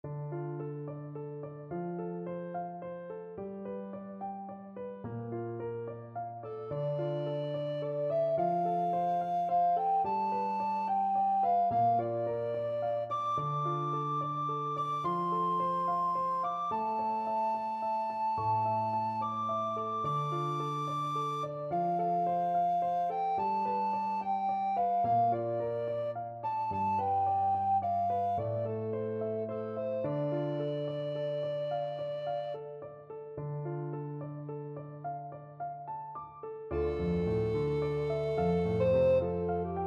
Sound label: house of the rising sun, alto recorder